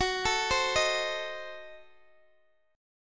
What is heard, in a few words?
Gb Diminished 7th Guitar Open G